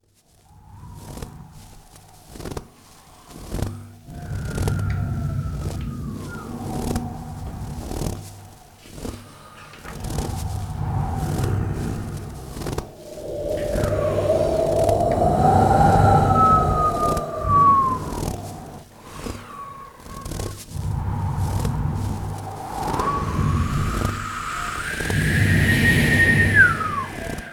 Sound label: snow wind; ZOOM